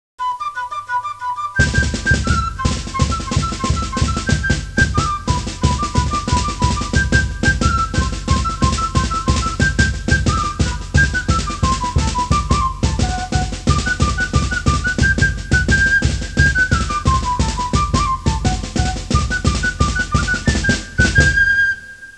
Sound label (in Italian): musica strumentale